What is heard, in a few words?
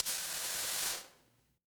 fire electric fuse